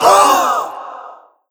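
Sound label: suitor attack, creature; GammaAge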